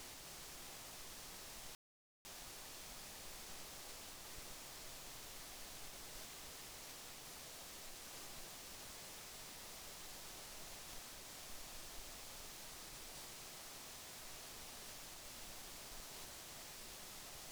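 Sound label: hydrophone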